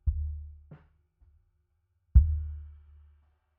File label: Sub